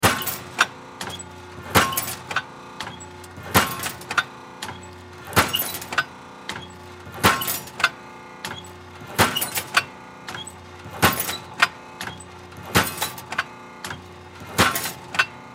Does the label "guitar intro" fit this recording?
No